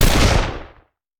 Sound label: Rifle; Sound Ideas; Guns